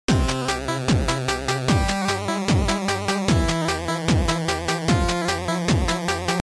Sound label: level win